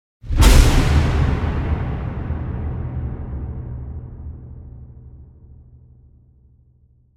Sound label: Impact Metallic Big Shocking Strike - Strong Reverb; Hollywood Edge; sound designer's toolkit v2